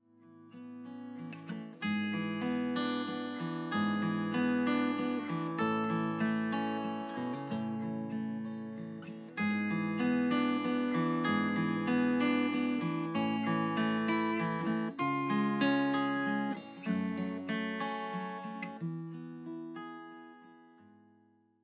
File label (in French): Larmes guit, U87